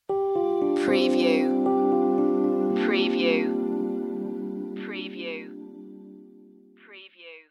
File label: distance arriving stinger